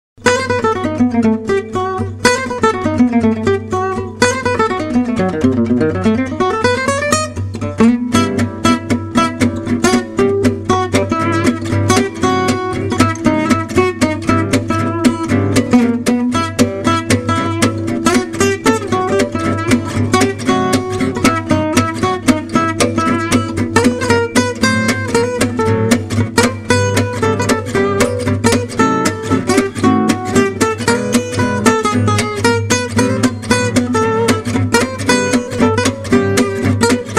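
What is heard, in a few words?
Manouche melody; MyVirtualBand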